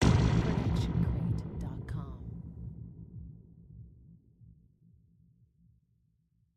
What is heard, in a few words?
designer sci-fi hit; SoundsCrate